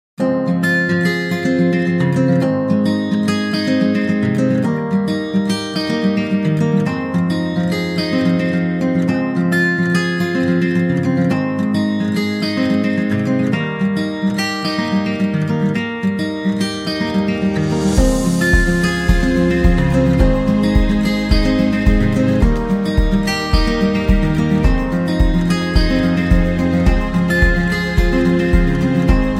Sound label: Upbeat Guitar; happy music vol 10